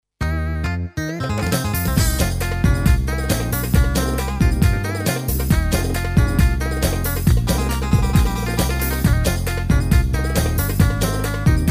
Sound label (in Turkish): halk, yamaha psr a300tr v2